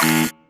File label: Error